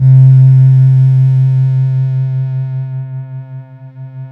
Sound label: SYN RESO, SYN MicroWave; Sound & Vision - Gigapack I CD 2